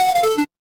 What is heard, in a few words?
retro game game over